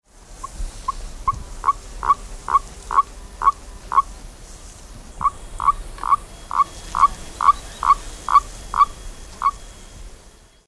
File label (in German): Erdkroete Paarungsrufe